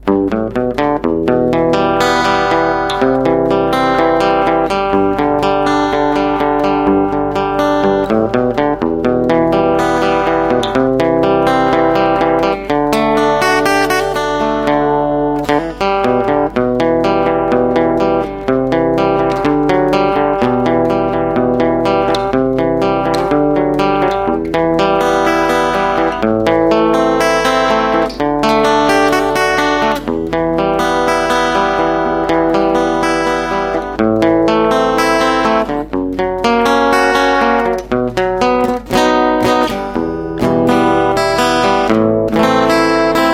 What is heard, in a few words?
guitar; STALKER